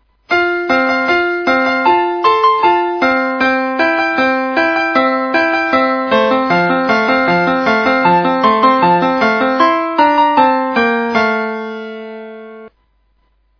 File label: Ten little indian boys, doorbell; 十个印第安人